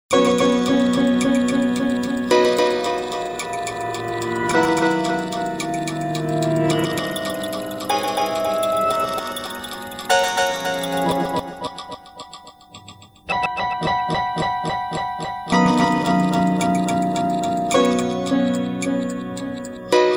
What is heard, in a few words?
Piano